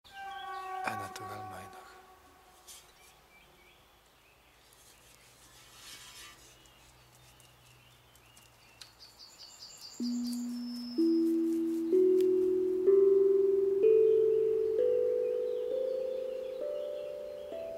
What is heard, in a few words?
E natural minor